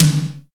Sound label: acoustic tom drum sound d sharp key